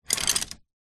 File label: foley wood skewers handful pick up from wood surface; zapsplat